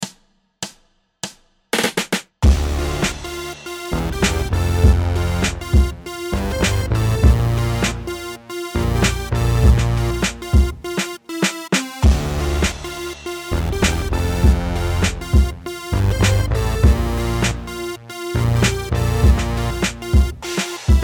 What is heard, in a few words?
Minus Guitar; beginner guitar lessons